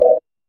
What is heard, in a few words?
rhythmic, うがい